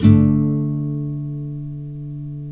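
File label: NYLON, D MAJOR UP, CHORDS; Spectrasonics; Hans Zimmer Guitars VOL-1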